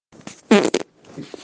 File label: fart